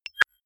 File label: click hover, signale; soundpool